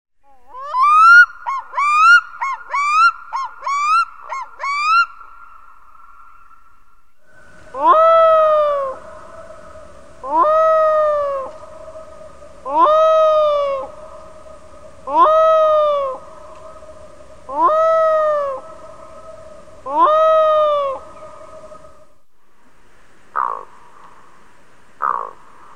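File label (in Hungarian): Sarki búvár; Madarak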